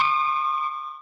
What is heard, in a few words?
Retry Jingle; Friday Night Funkin Lullaby ost mod pc gamerip; Missingno